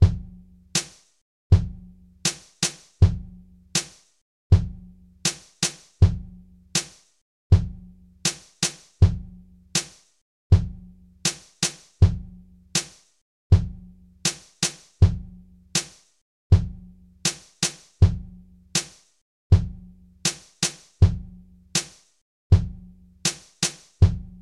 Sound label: drums calm; Cleanomancer